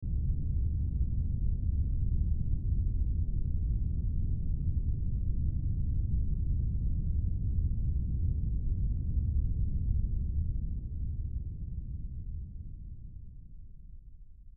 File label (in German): Dröhn